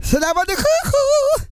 SING, Vocal Planet R&B & Dance; ILIO

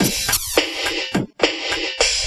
Slow Beat, Electronic Beats; Sonic Foundry (Sony Creative Software); Myths of Technology

